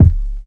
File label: kick, Classic Hip Hop